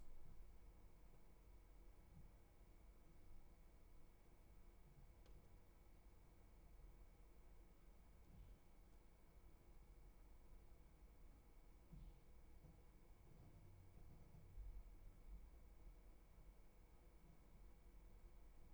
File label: roomtone, Valdivia